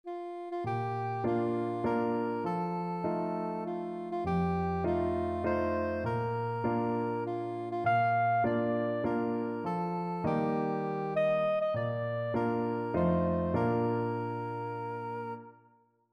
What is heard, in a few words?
happy birthday soprano saxophone